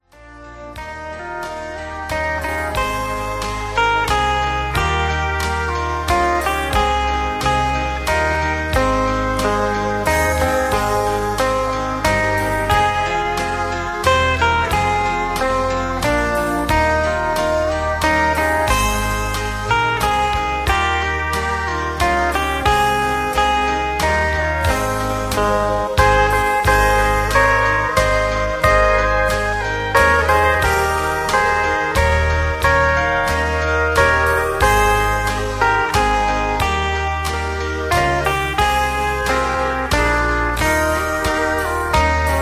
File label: Low Key with Leads